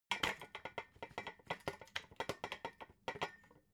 metal scaffold pole rattle; industrie-wirtschaft

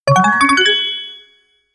notification call

